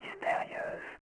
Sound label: Whisp; alone in the dark 4